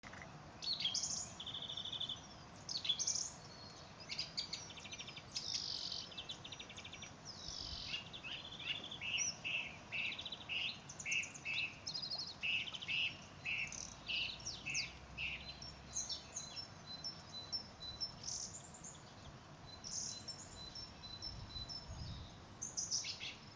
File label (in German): Singdrossel im Bürener Garten